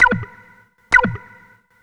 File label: Synth, Techno